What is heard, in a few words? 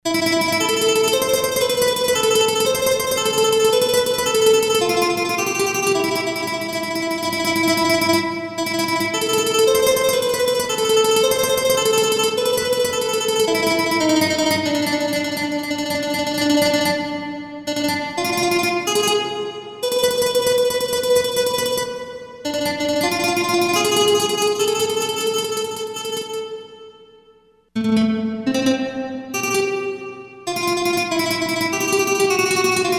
Mandolin Trem